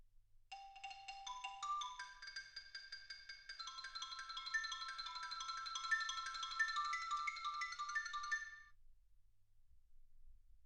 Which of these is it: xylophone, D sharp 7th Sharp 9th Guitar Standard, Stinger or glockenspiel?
xylophone